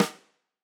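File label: Tam Snare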